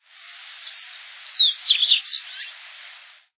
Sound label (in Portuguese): sanhaco-de-fogo